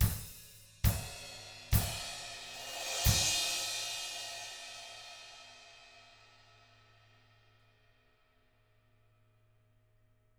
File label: Double Platinum Drums, COUNTY; ILIO; Double Platinum Drums 1